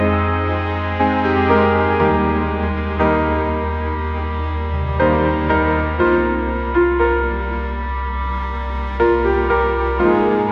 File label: upbeat pop melody